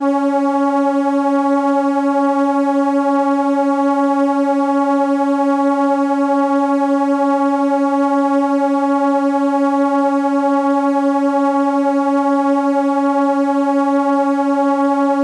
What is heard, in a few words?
violin C#4, ARP Solina